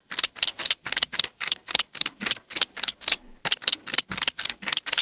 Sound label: squelchy pneumatic robotic clasping movements